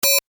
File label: Tech beep, Beep, High Tech; Tech Sound Effects; Tech (Sound Effects:Science Fiction); Stuart Duffield